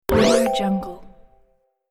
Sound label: button activate; sonic-boom